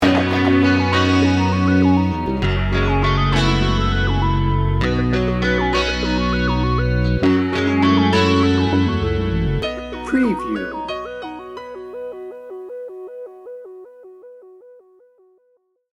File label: floating sting bumper